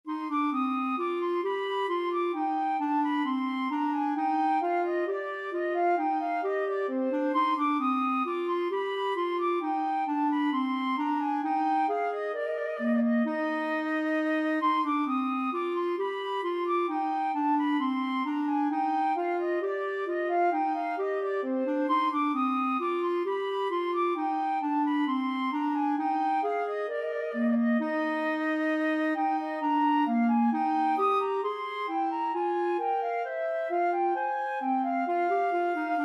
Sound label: bourree, flute clarinet duet